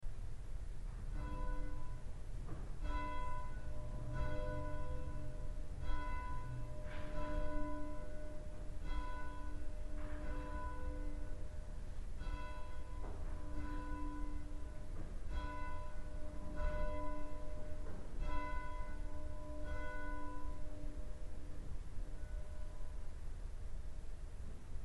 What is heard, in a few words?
bell